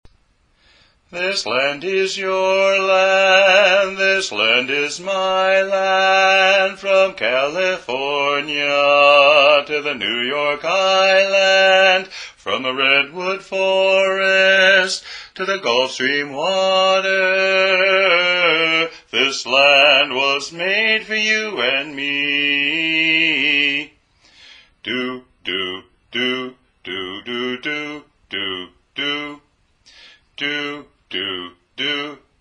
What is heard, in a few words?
Bass; for god & country music